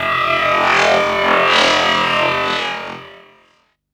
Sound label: FX, Drum & Bass